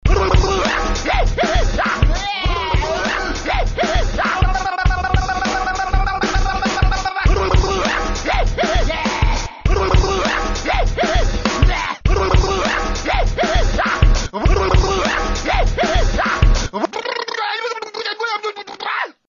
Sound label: hiphop loony